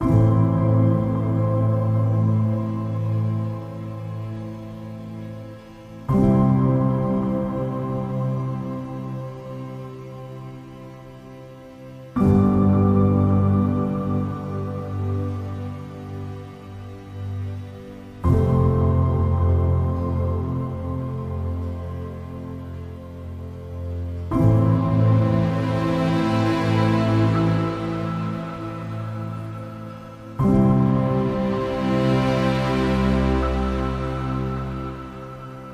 Cinematic Piano Documentary

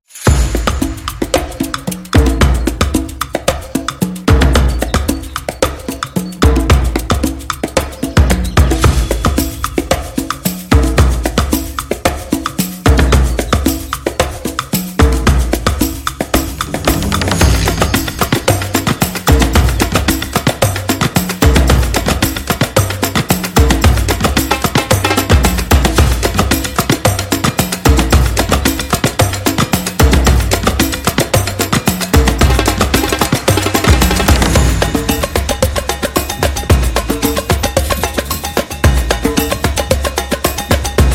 Nature Amazon Percussion Beat; amazon drums

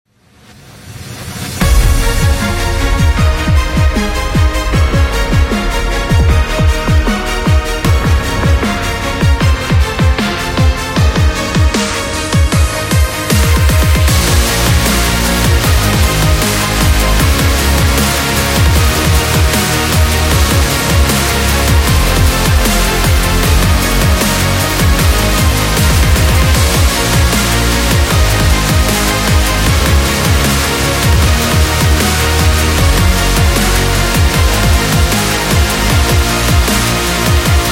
Epic Drum Bass; sports training energy

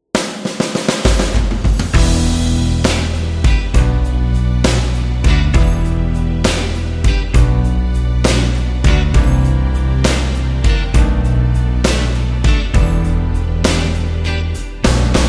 Blues Ballad

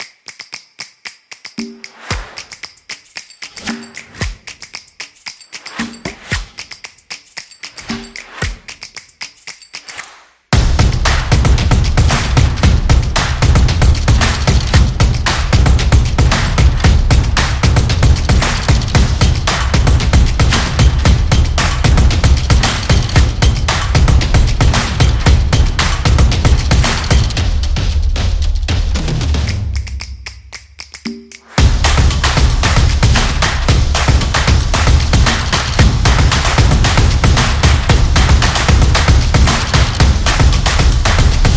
A Percussion Intro; soccer drums

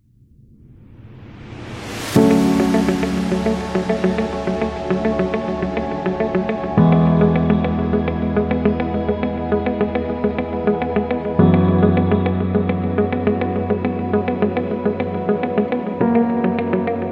Clean Background

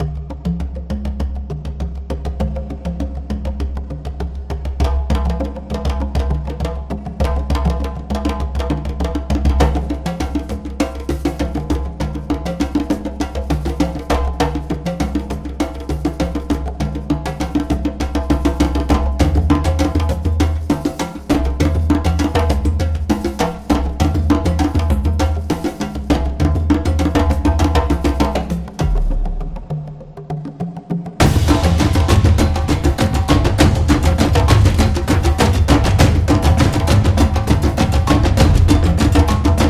African Drums Tribal

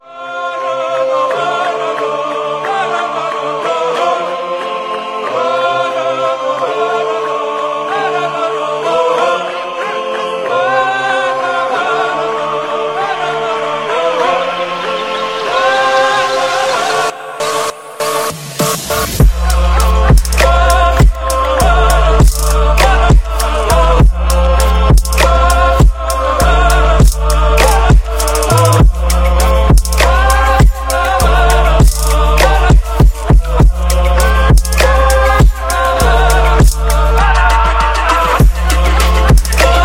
Middle East Trap

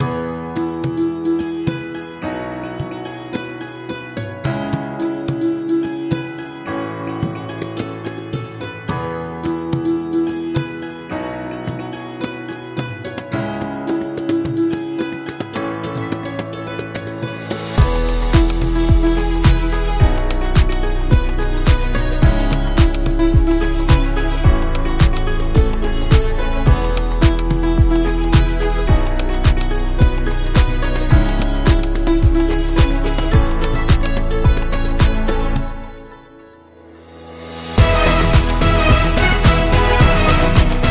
India Background

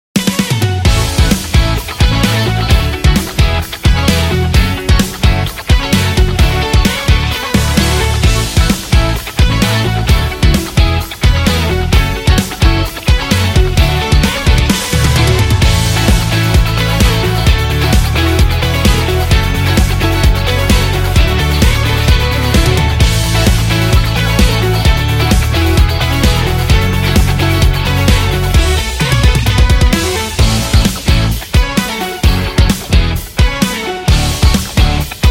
Happy Rock

